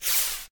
Missile fly

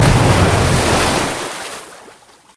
shark hide

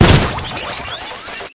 ufo box down up

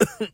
Scream

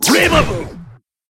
auto attack

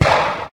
Magic bomb

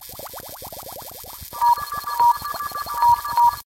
game over background

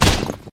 jump; Monk

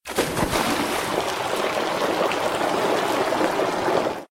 skill boiling

